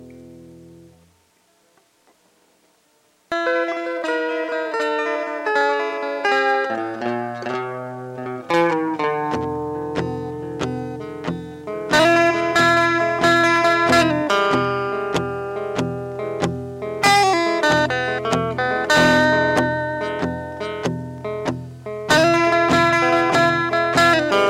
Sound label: blue instrumental